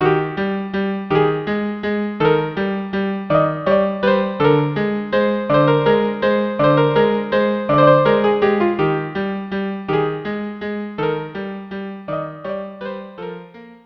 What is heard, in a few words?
Valse Viola duo; La Traviata